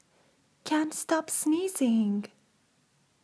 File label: cant stop sneezing